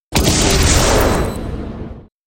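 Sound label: explosion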